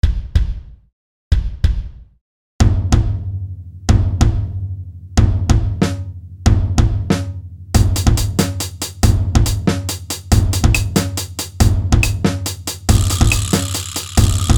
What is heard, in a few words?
Drums